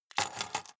key ring large place table

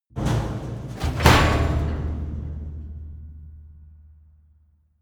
metal container impact large multi

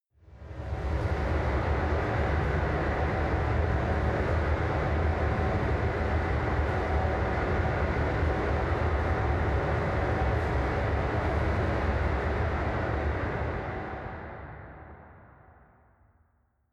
machine room

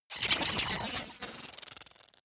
glitch shrill

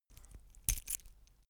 metal watchstrap rattle

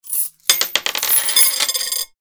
coins dropping

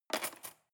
cutlery cake fork drawer scrape

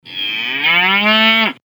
cow

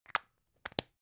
plastic spray can small close

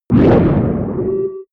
whoosh distorted swish humming

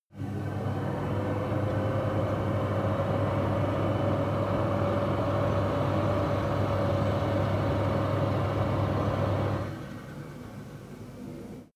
stove extractor fan light

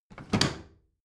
bathroom drawer close heavy

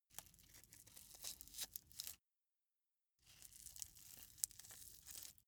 velcro large close slow

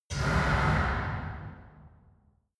metal crash

